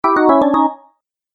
Organ Game Music; Cartoon Music Sound Effects

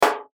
Error Message; Electrical Button; Multimedia; Electrical Buttons; Blastwave

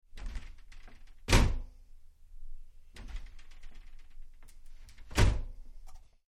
Shower Door, Glass cubical door open and close

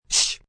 Ssh Angry, Shhhh, Short, angry; human voice speaking; Whispering Sound Effects

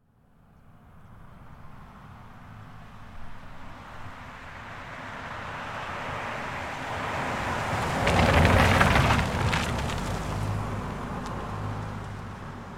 Car Highlander By Corner Dirt Med; 2005 Toyota Highlander V6; Cars; Vehicles

